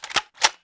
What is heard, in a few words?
crab attack